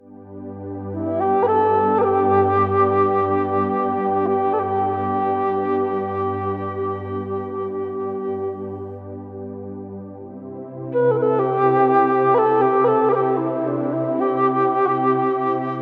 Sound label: Music For Cats Jazz Music Therapy, Forest Nature